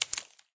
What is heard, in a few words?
branch snap